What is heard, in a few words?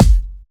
Bassdrums Breakbeat; Breakbeat Kicks; Vengeance Samples